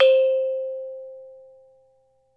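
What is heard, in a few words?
METALOPHONE, Pitched Percussion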